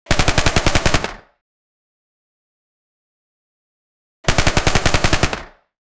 minigun shooting sound; automatic

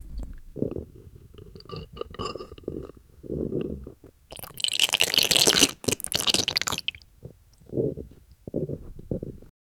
ferro fluid slowly slurpi; science

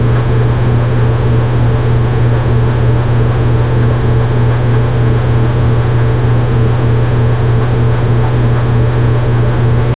loud and unpleasant sound, broken

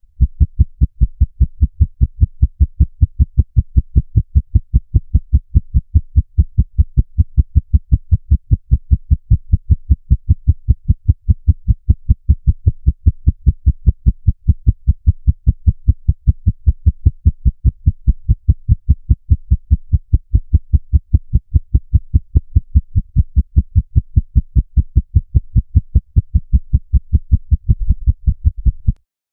a heartbeat heard through, medical device

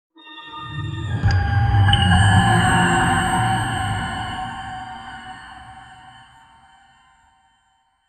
dark magical energy spark

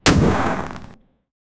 a small cartoon style cannon

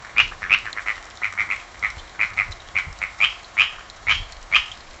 crabs skidding slip with

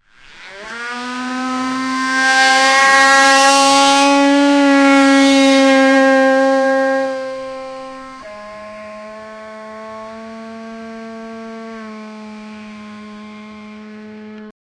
mclaren 720s high speed f, sports cars

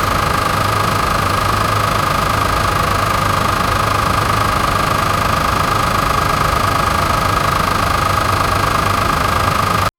semi truck starter motor

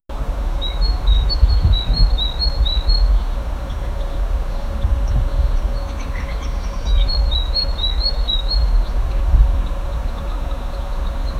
birdsong and the sound of

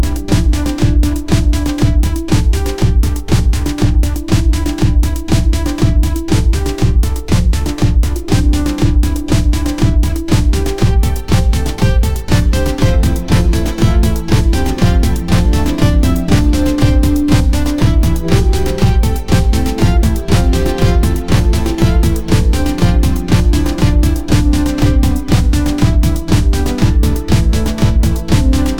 the music is gaming

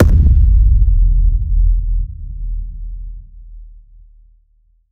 cinematic boom hit deep; scene change

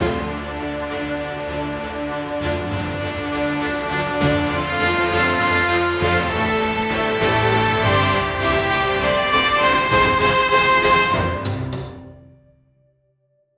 exciting adventure buildup

